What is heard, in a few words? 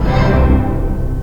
orchestra hit, ensemble